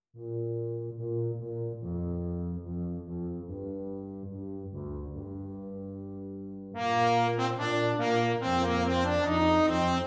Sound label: Brass Sextet; flex brass sextet or brass choir